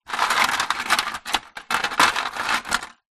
household freezer ice cubes in plastic tray movement